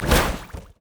inferno bomb; LoL; ziggs poolparty

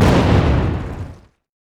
lay waste; lich; karthus

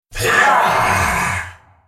Droid spellcast effort

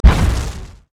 tower; LoL

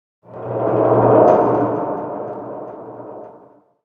big chains groan

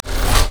chaos storm buildup; LoL; viktor

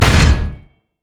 basic attack; LoL; mordekaiser

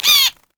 ravens caw; odin

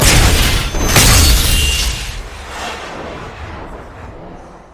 alter lock; LoL; altars

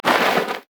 sad mummy bandage toss; LoL; amumu

